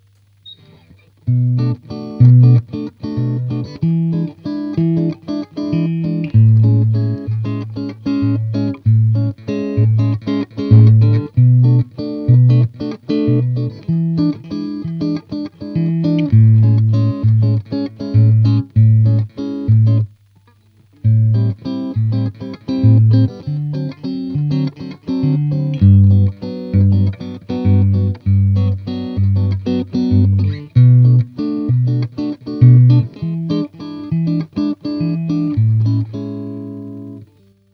Bossa 6V6

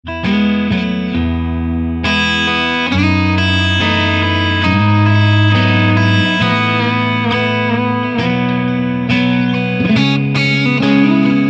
EL34 mix

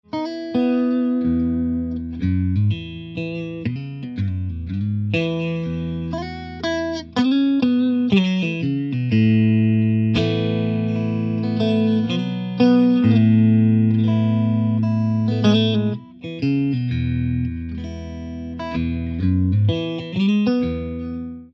EL34 clean

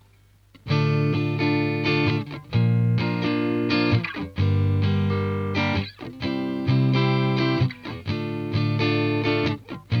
Sound 6V6; Aubert

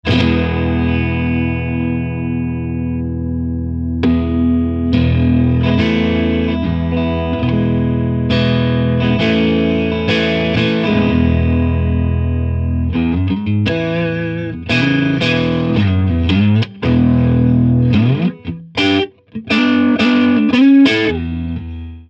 G5 EL34 crunch neck

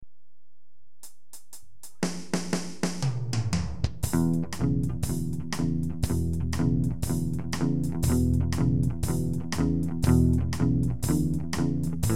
shuffle

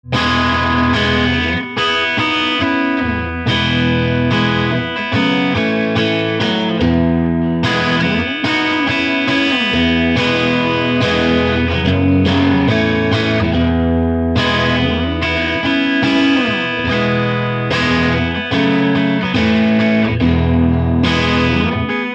EL34 satu bridge

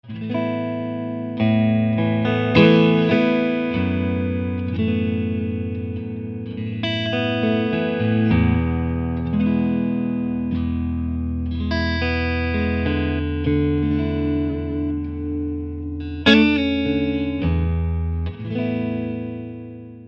G5 6L6 clean

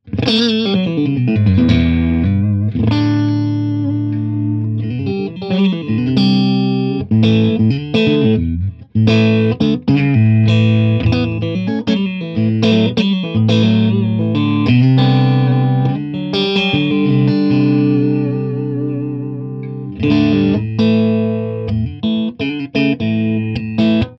G5 6L6 crunch

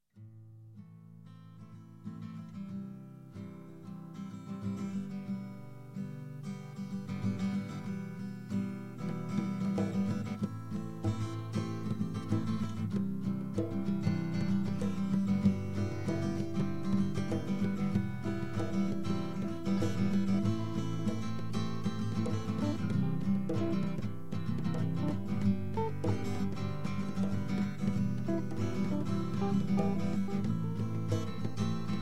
compo accoustik

